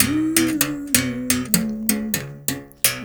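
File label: BS BERIM GRV, BERIMBAU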